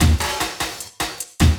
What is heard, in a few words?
LOOP, 150 BPM, Drum & Bass